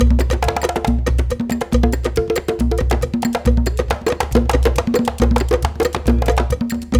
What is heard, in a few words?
138, Lead Drm, Gyana HiLife